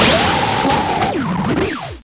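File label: hard robot death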